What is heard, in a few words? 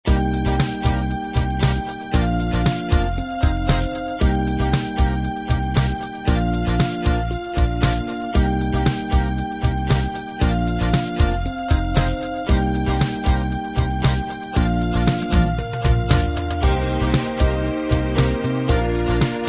pop ballad